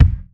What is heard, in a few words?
clean urban kickdrum sample e key; kick drum samples